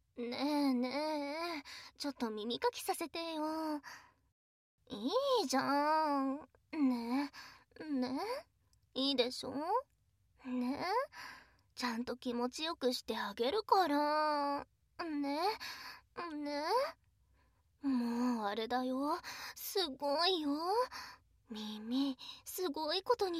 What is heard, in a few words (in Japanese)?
耳かき, ASMR